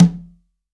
CONGA, STEREO CONGA

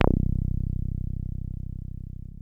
303 C1, TB 303

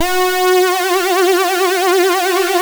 Synth and Organ

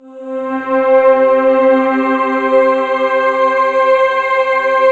PAD C4; Best Service Gigapack 1

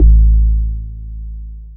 bass sub; Ueberschall; Techno Trance Essentials

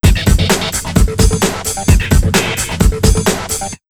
HARD 130, Big Beat Drumloops

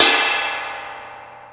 Ride, Cymbal; KJ Sawka; Drum n Bass & Beyond; Drum Tool Box

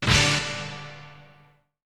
Hit; ZERO G - Datafile DISC 1

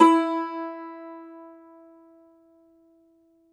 cumbus saz E, plucked strings; E-MU Producer Series Vol. 7 – Old World Instruments

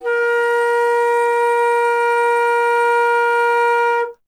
Alto Flute; Miroslav Vitous; Solo Instruments

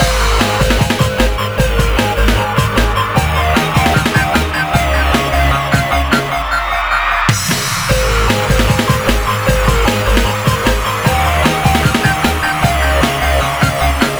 Robot Live 152 Abmaj, Hardcore Breakbeat; Big Fish Audio; Hardcore Breakbeat Action

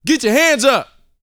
VOCAL F; ILIO

